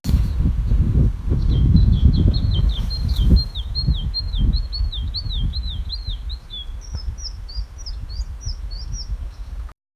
the light sound of wind, breeze

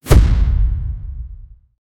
powerful but soft firebal

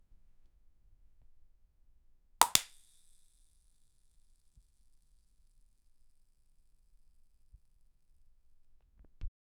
soda pop, beverage

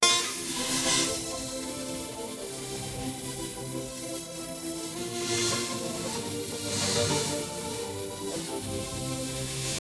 ancient battle

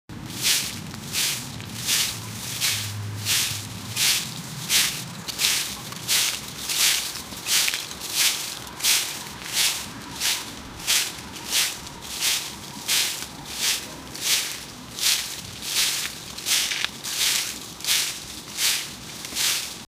raking leaves upper class; nature

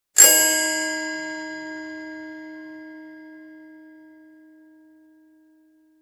a single high pitched chi, chime

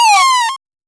collect cong in 8bit game

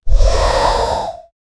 a dragon roar that shakes; mythical creatures

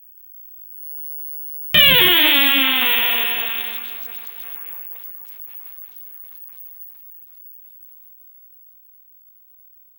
crowded say free, protest

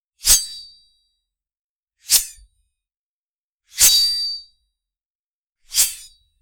a sword slicing through the air with a whoosh; combat

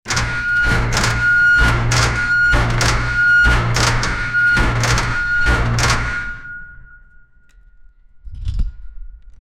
sound of a heavy hydrauli, gate closing

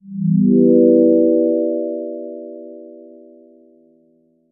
metro public address chim

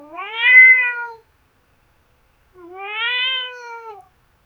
a cat meow it should

